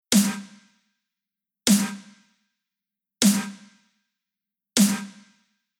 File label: Future Bass Rhythm Bass Snare EQ On